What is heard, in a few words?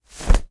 item umbrella pickup